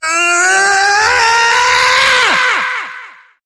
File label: yelling; sonic